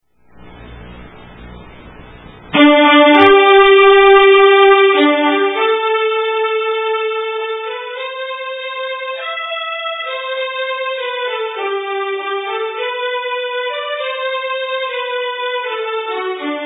Kyrkmarsch

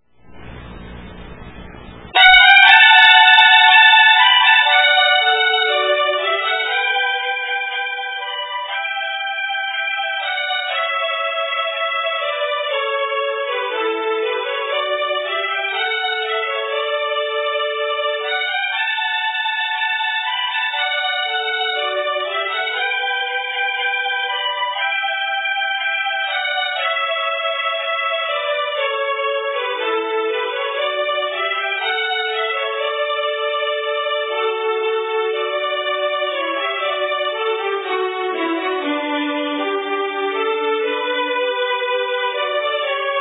Brudmarsch

